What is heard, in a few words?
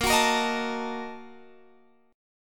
A sharp Major 7th Suspended 4th Sharp 5th